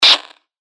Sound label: FX Hat, cymbals; revealed cymbals vol 1